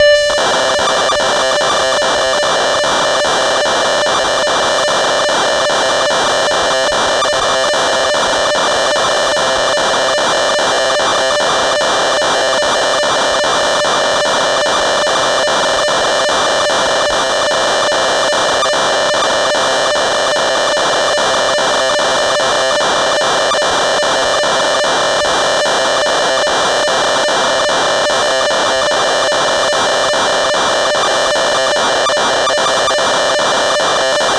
Rhodes Polaris factory presets, Cassette Interface; Synthesizer Factory Patches